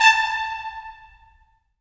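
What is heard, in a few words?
brass trumpets staccato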